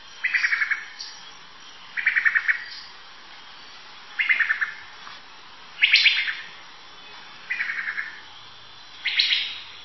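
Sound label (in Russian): otryvistoe shchebetanie drozda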